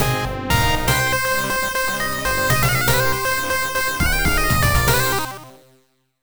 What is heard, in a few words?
Game Clear; Olmang Jolmang Paradise; ms-dos; Go Forward